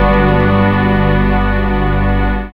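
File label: synth strings; E-Lab; X Static Goldmine 1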